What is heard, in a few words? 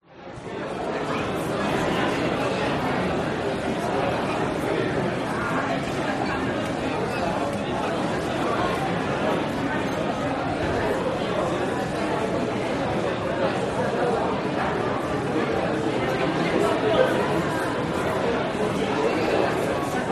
France Strasbourg Lively Street Dining Walla